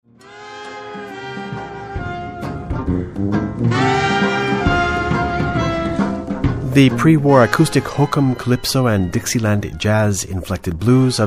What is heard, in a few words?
Blues Band